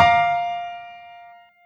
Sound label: Three F Bb5 D6; osu